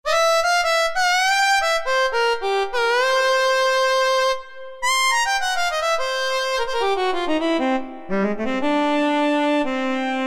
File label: Harmonica